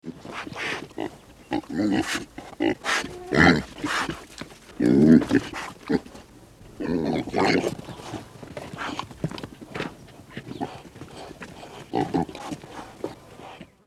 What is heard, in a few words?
Pig Grunt